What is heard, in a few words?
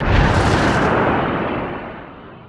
tornado cast; weapons; dota; invoker